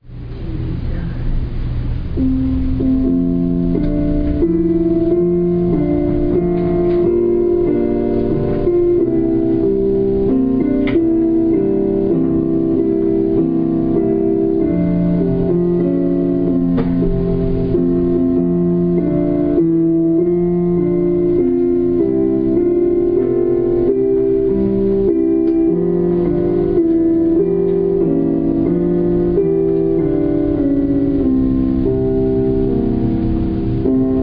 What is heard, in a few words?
Piano prelude